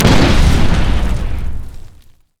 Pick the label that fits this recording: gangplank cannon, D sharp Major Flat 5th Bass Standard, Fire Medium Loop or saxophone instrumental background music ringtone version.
gangplank cannon